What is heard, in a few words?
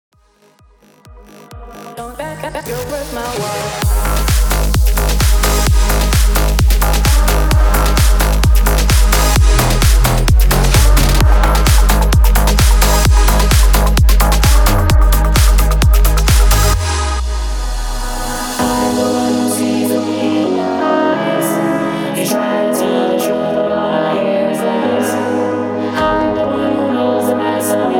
130 BPM